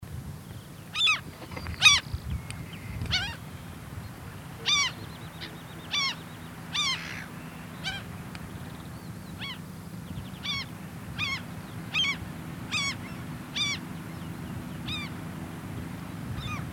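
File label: common gull voice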